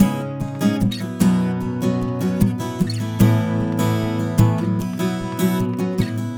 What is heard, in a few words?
Acoustic Guitar; Guitars